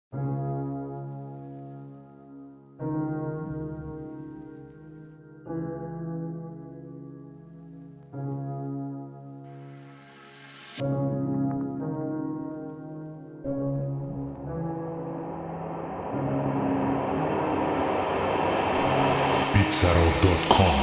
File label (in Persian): بیت اولداسکول